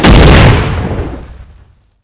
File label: explode